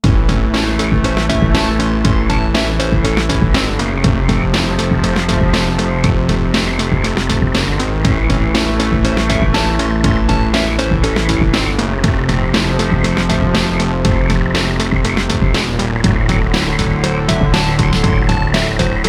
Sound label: Overworld Swamp Loop With amb; Rad Rodgers Radical Edition